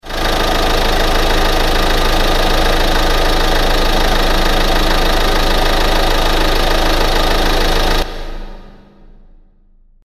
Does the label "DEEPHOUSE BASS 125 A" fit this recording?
No